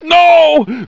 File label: pain; sven; lowell